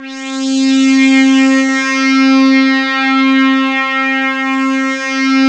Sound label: SWEEP; East West; Global Sounds; CS70M YAM